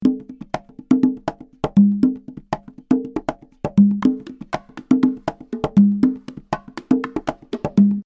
Conga, ringtones; Itel; Power 80

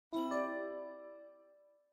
usb effect; Tecno Pop 9 4G; ui

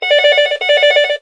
Landline, ringtones; Infinix; XOS 15

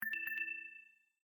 Low Battery; LG

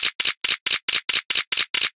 camera shooting 9 shot 2 sec; Kyocera; Cadence LTE

